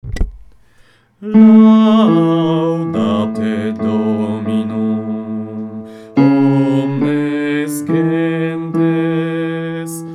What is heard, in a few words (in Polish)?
bas; Gloria Psalm; pasyjne; meskie